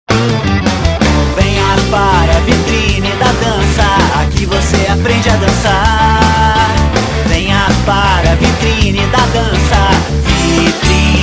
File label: guitar jingle; vitrine da danca